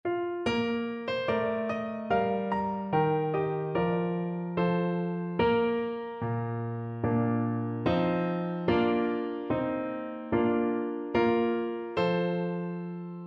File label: horn, French Horn